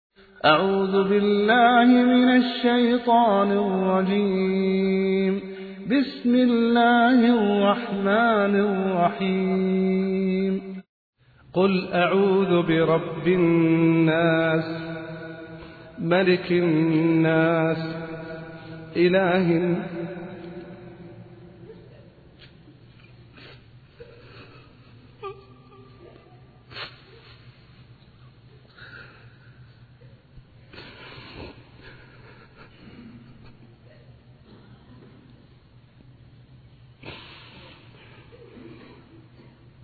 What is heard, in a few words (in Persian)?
سوره ناس; العصیمی